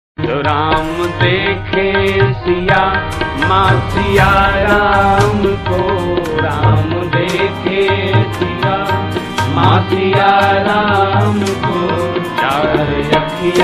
Instrumental; Adipurush

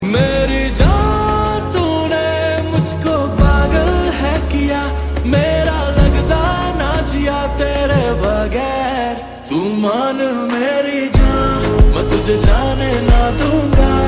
love song

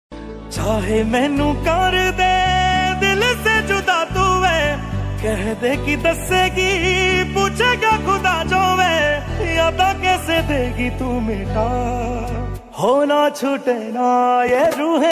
Punjabi song

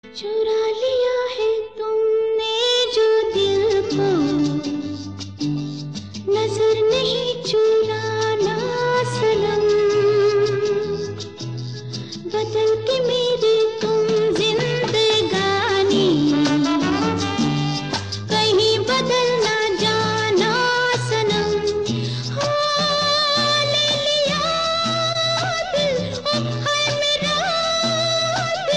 Male

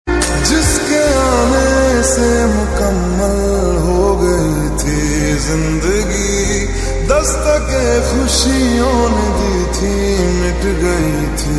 Lofi

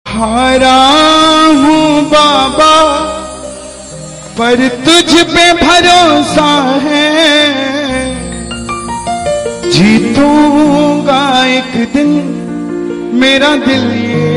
Song

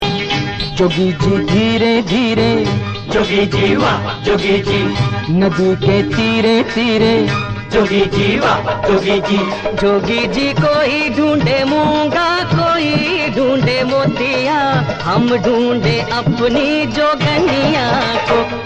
holi song

